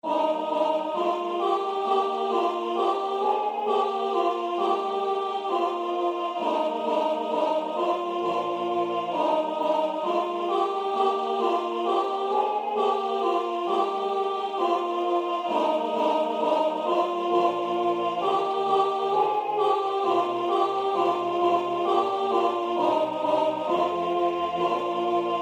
Ubi caritas; Durufle; alt

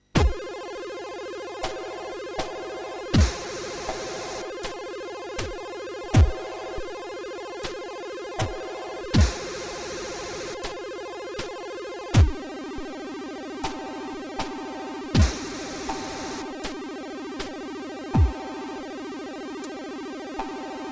20-220bpm sync; prophet64

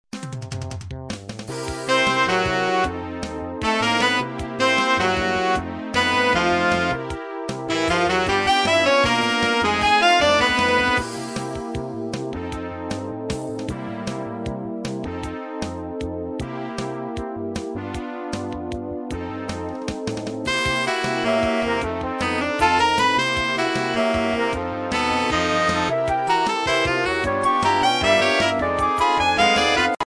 blues

